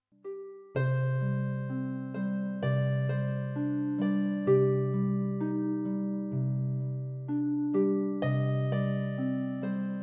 Harp, XV-88

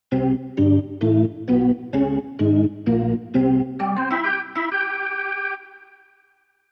Organ sports, XV-88

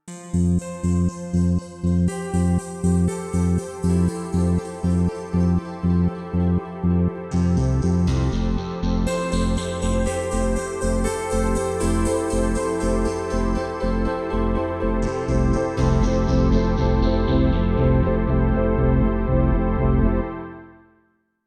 Synth section, XV-88

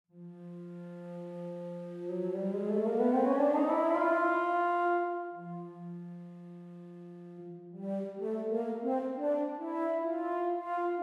Pure Data graphics tablet flute synth test

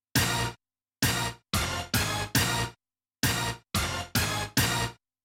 Hit cliche, XV-88

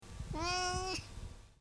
Miaow

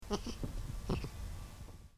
Vocal Purr

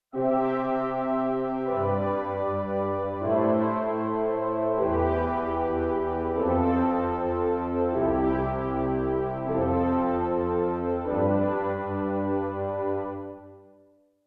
Tudor Horns, XV-88; Taco Bell

